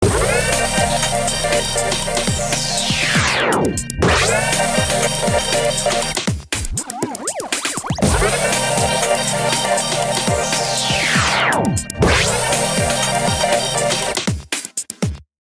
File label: synth